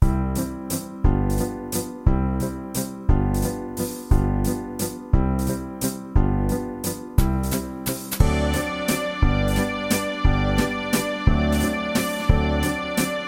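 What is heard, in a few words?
Viennese Waltz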